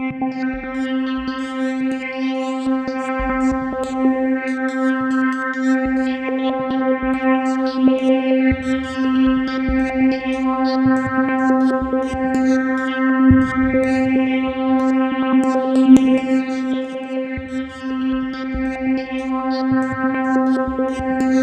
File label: C3, Synth; NiceBeats